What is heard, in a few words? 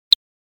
Claves; drums; e-drums